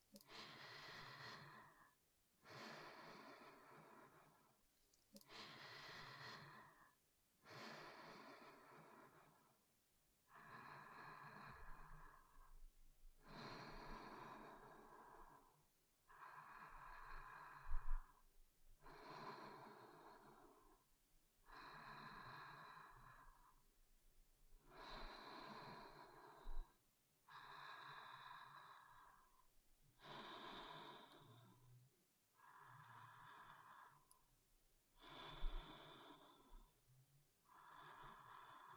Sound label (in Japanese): 添い寝 寝息ループ（バイノーラルマイク）